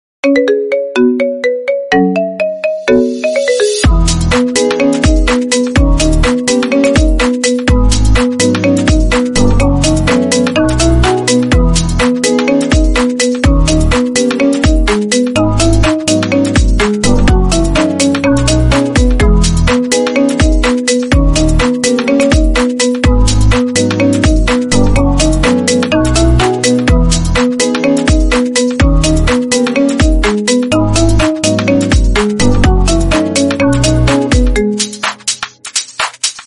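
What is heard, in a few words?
ringtone marimba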